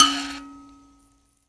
PLK Grand Mbira; Roland LCDP11 Africa VOL-1; M'biras

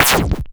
FM Slap, Cwejman S1

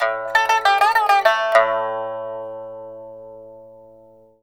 PIPA PHRASES; Spectrasonics; Heart of Asia

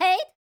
YODEL, World Voices; ILIO; Vocal Planet

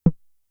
Tom, Toms; Fricke Schlagzwerg; Drum Machines

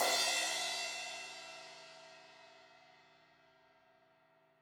Crash 16in edge, Cymbals; Deeply Sampled Drums

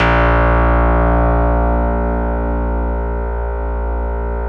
RESO METAL 2 A#1, Yamaha VP-1; Music Store

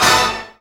HIT, Orch Hits; Roland - Brass, Strings, Hits and Combos; Orch.Hits 1

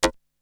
Perc; Drums; ZERO G - Datafile DISC 2

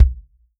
REAL DRUMS POP KIT

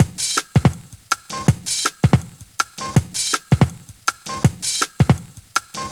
162, breaks, Loops; M8

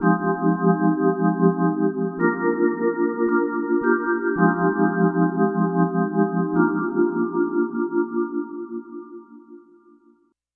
Vibe Pad, Funk; Dr. Fink's Funk Factory

